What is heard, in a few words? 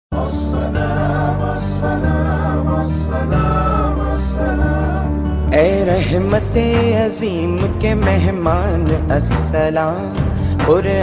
naat ringtone, islamic ringtones; ramzan mubarak